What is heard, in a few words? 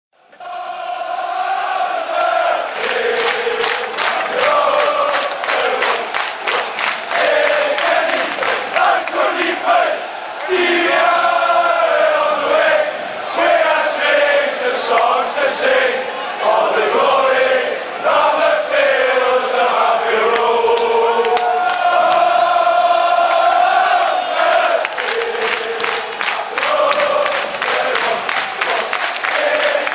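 Liverpool, fan chants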